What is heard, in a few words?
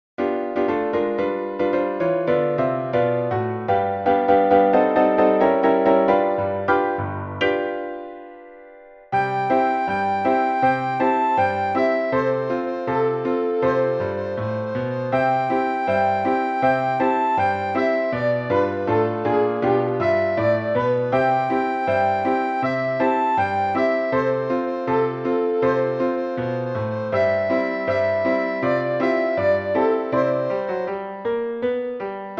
Horse Race; Blokfluitles